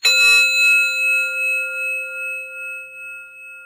Chime

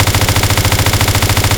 mp5 shoot loop

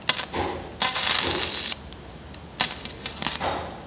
welding

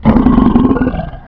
alert, panther eye

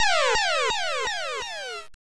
death

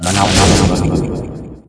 draw, weapons

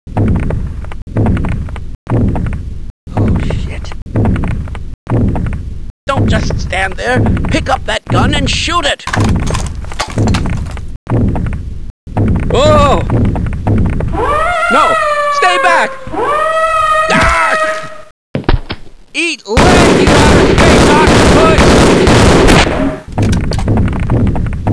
gonarch

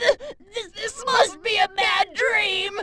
death; gotenks

